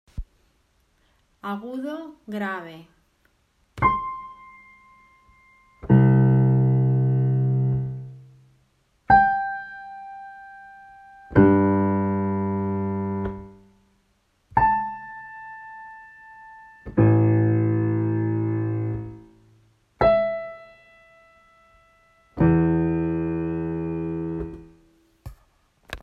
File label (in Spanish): Sonidos Agudos graves